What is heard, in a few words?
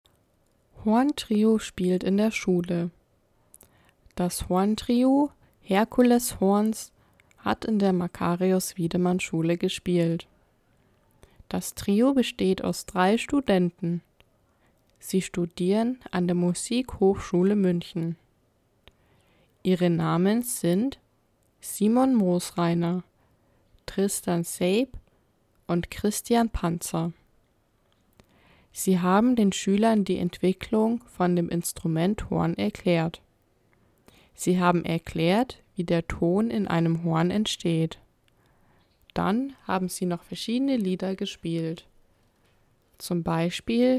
Horn Trio